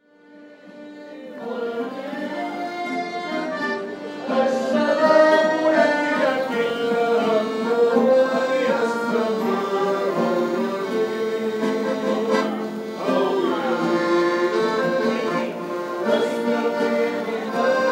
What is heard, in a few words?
group with accordion istiklal